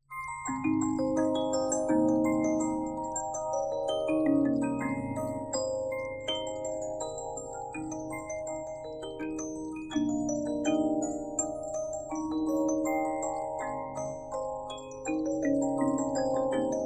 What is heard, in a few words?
Magic Flute, musicbox